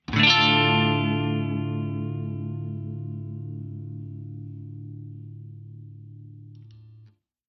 Clean Strum

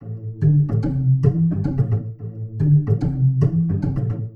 110 A, Warped Bass; Loops; Upright Monk; Touch Loops; CosmicFunk

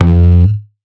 synth shot F; Electro; Anthemic; synth shots

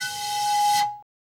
bottle, ethno, 125

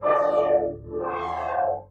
SYNTH PAD ETHNO 125 A, Sphere

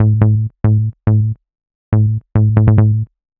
ELECTRO BASS 140 A

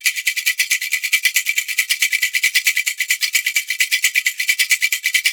ETHNO PERCUSSION 90; ethno2

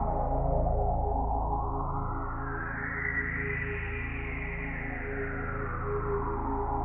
techno pad 140; Techno2; Keys

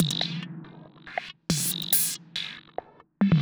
electro beats 140

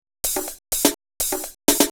HOUSE BEATS 125

